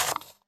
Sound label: turn start; Cards & Board